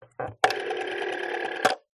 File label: household telephone old rotary dial